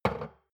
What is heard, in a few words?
shot glass place table medium; soundpool